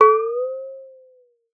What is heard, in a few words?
water; Xsample VOL-9